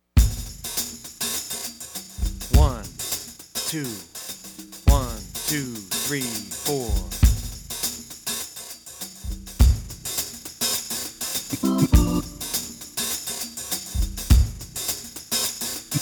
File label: Motown Groove Etude no bass